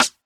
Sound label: Rim; Synthwave; Drum Samples; TimeGlue; Futurism; Synthwave Loops